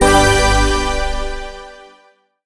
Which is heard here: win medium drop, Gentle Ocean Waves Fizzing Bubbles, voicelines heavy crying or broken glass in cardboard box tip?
win medium drop